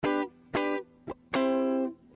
Guitar

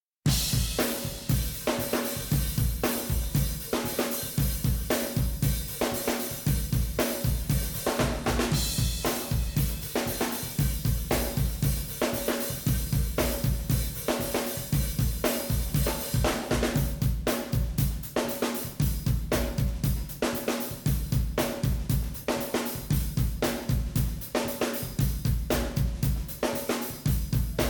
drums

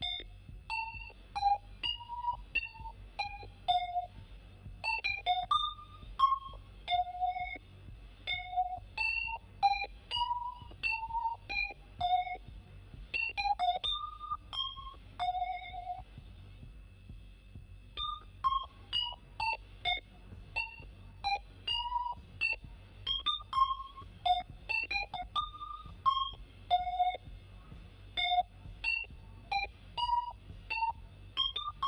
Synth - Break Melody

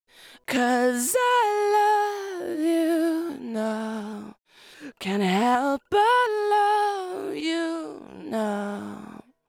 lead vocal chorus; Multitrack

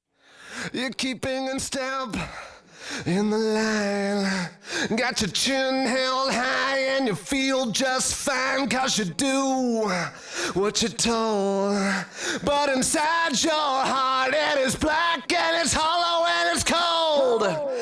lead vox

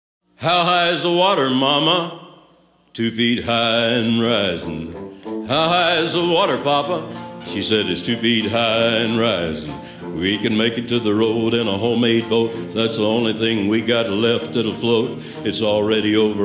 vocals

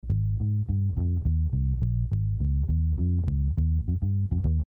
Bass

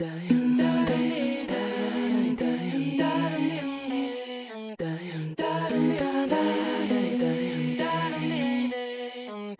Effected Vocals Chorus; Multitrack

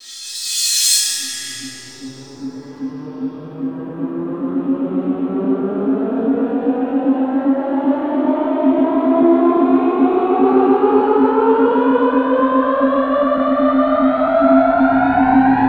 FX; Effects

